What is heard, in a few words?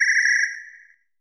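FX WHISTLE; AKAI S-Series CD-ROM Sound Library VOL-5; FX PERC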